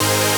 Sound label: Synth Cuts; Vengeance Samples; VES1 Instruments